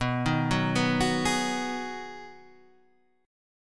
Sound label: B 6th B, guitar chord